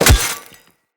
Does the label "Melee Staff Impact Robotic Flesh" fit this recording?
Yes